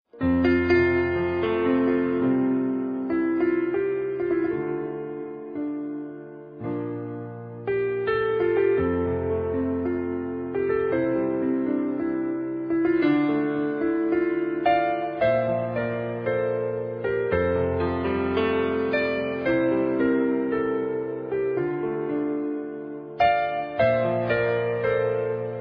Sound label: Soft Piano